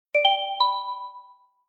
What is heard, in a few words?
beep